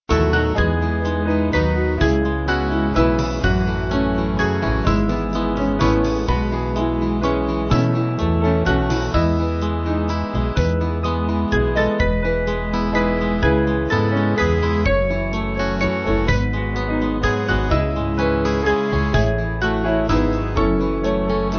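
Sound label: Band, Music Backing Files for Churches; O Lord Our Lord; Tramps; 3/E-F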